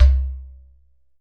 GHATAM

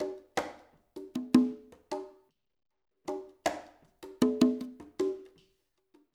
ICE CONGAS, Liquid Grooves; Spectrasonics; Liquid Grooves 1 & 2

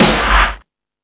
Space Drums, Drum Machines

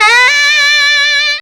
FUNKY SAX; Zero-G; Funky Elements

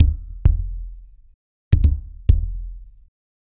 dundun; ILIO - Ethno Techno; RAVE COW 132

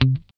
GUI C3, E-GUI MUTED; Sound & Vision; Gigapack I CD 2

